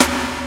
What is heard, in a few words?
SD HOU, Dance Mega Drums; Best Service